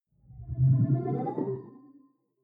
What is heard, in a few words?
sci-fi display transmission damped